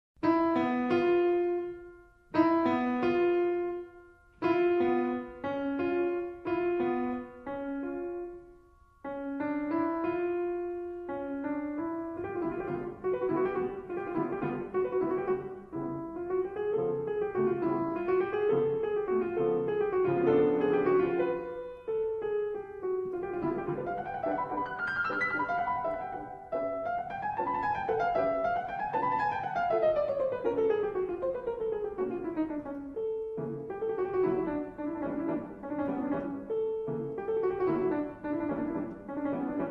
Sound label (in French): bagatelle sans tonalite; Liszt; romantisme